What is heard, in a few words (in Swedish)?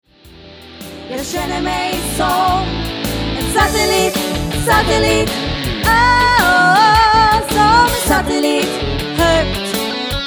Svenska hits